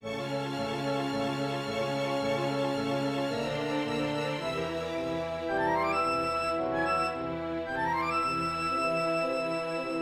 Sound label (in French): foule essai orch sans transpo non ambiance